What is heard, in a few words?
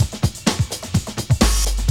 Loop, Roland; Sound & Vision - Gigapack I